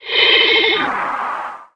facehugger threat

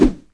foot fire, kick

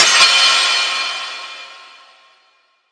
sword transform

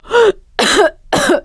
cough, cough male

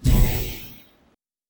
camo off; halo; arbiter

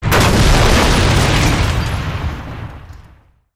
explodes; gow; fortifications; centaur

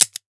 dry fire revolver

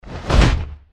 deathclaw swing; npc

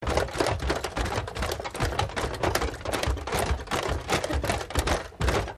gunstand tripod loop; gow; turret judgement

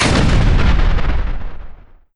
explode, revanent; doom3

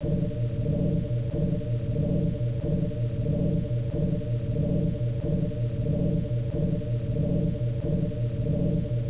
idle, weapons; tfa cso; holy sword

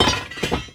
wep ground

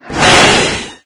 attack solo, maggot; doom3

